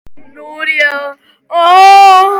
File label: ooooo